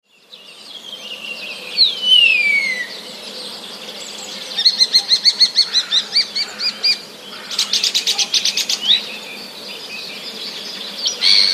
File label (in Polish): szpaki, charakterystycznych dla szpaków wysokich, wibrujących gwizdów; wroblowe; zapozyczenia